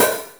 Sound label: drum hit whistle